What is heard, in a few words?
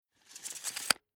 Metal Tape Measure Automatic Retract Into Casing; Hand Tool Sound Effects